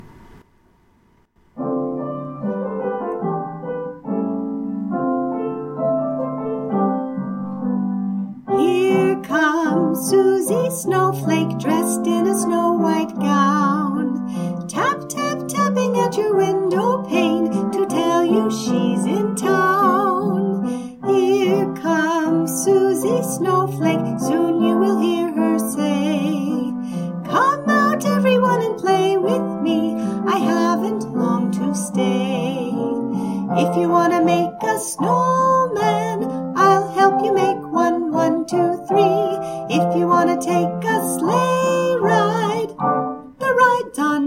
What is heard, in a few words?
with vocal